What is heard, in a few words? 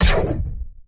laser impact